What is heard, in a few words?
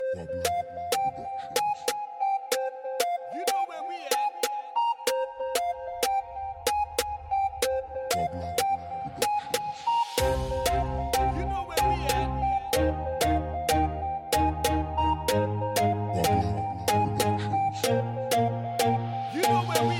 Reggae Dancehall